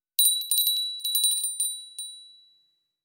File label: Small silver steel handbell ringing